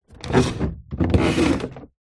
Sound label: household television flat screen slide movement adjust position on table